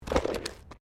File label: Body movement Slide Road; Body movement foley to simulate falls and deaths; Bodies; Human